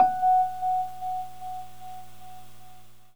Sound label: Rhodes, F#; 3D Sound; 3D Keys VOL-1